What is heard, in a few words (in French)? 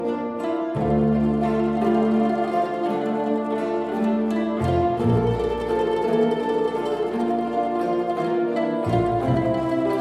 Mandoline